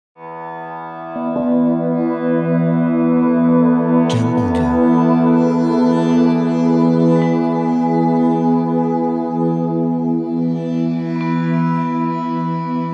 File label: Tibetan Sounds